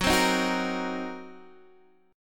Gb 6th Flat 5th Gb down Guitar DADGAD

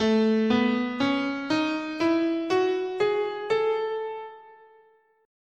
A Lydian, scale

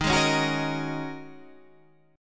Ab 7th Suspended 2nd Suspended 4th, chord

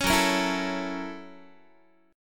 F sharp 7th Sharp 9th, Guitar; F#7#9 strummed

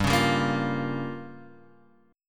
G Minor 7th Flat 5th

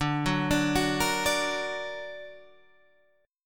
D Augmented D down Guitar Open G

